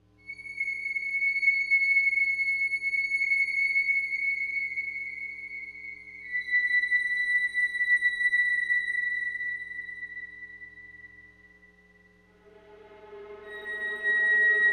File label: Center Circle Mx; moul